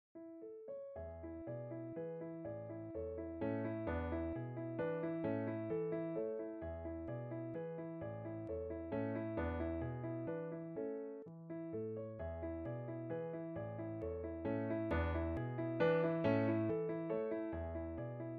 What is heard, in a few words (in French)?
Valse Pour Piano; Ludwig van Beethoven